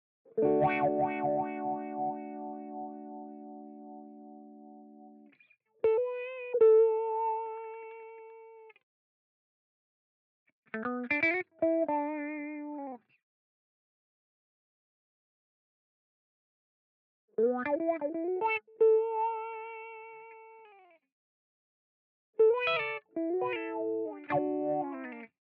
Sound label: Wah Gtr